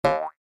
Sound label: Jump; Red Ball 4 Vol 1